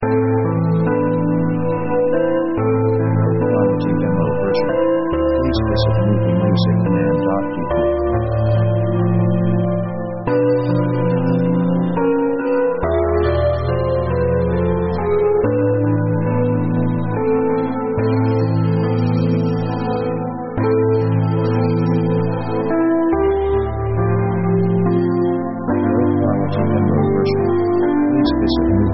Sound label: synth orc russian walz; synth themes